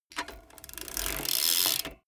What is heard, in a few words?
bmx bike pedal once freewheel brake short